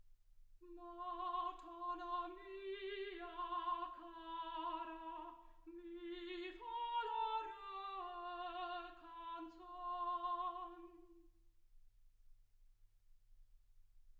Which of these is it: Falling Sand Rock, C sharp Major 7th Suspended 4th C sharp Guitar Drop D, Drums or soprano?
soprano